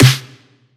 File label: snare; Slate; SD MIDNIGHT Sample Pack; trex